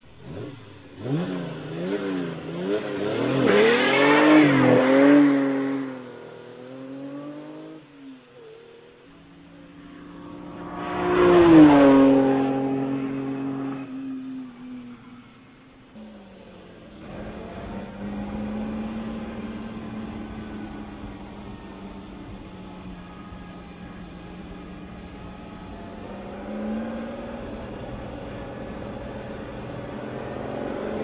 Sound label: Ferrari 250gto, motorhangok; autosport